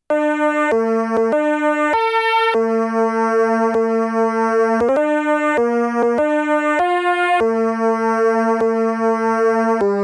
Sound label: canon de la paix ALTO